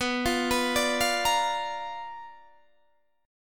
B Minor Major 7th Flat 5th, Guitar, Open D

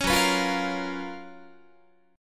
F sharp Major 11th Guitar; F#M11 Chord; strummed

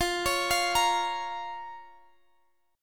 Bb Minor F

